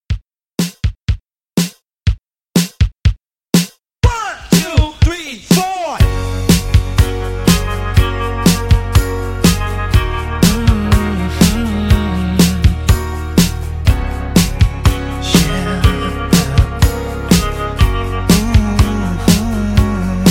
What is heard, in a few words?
Re Drum 122 CLEAN